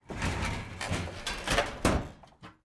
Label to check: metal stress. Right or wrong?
Right